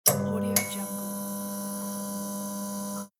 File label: neon light on